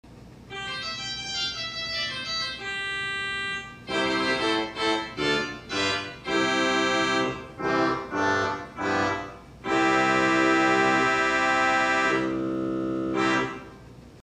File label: Trumpet, theatre organ